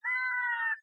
Night Bird

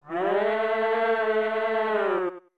Four Giants Shout; The Four Giants shouting (2 shouts, 4 pitches each)

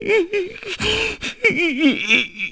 Father Cry; Maggie's Father

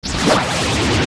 Parasitic Tentacle Hit; OOT

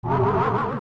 Bubble Shield Up; zelda; OOT

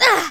Hurt Shock Burn; getting hurt; getting shocked or burned; Link; #2 also used for getting shocked or burned

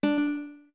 Notes Guitar D2 short

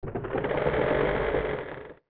Door; Clock Tower doors opening; Zelda

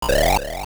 Angler Fish Lunge; Angler Fish lunging

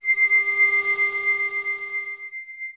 Sun Switch; OOT

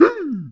Moblin Find Weapon

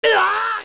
Thrown; Link

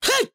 Attack

